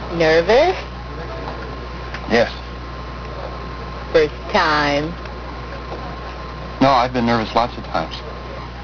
AIR

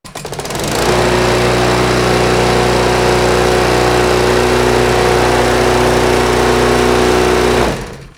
MOWER

